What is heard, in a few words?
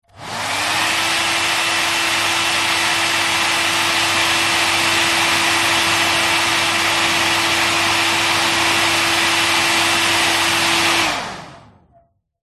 household hairdryer on off short close up rear; zapsplat